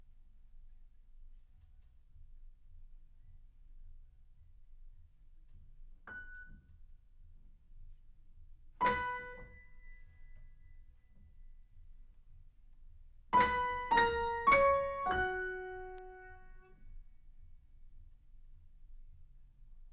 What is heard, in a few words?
Piano Room